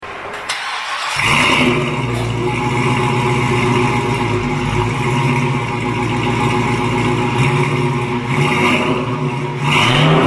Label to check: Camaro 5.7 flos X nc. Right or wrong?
Right